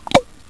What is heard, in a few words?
Show Door; Cave Dig